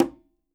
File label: QUINTO, Percussion